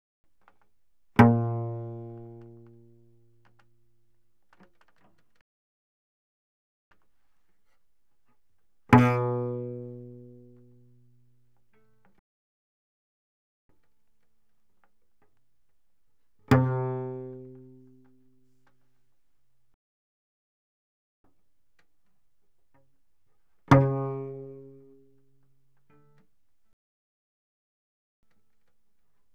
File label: Cello pizz ff sul C Bb2Gb3; Strings